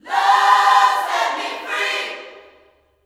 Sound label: LUV FREE, Vocal Gospel; Vocal Planet Vol1 Gospel